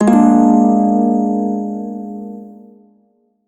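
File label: Shawzin Minor Chord; Warframe; Prime Shawzin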